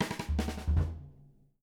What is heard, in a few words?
310 BEBOP, Living Drums; Sampleheads; Peter Erskine